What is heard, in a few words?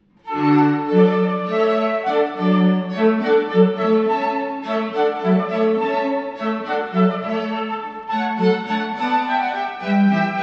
horn pipe